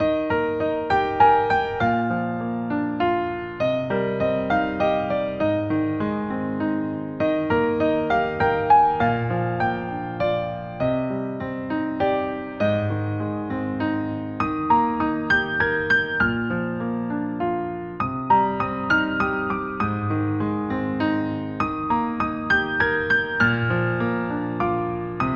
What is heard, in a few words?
Piano version